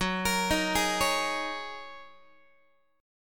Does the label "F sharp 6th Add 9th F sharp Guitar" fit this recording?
Yes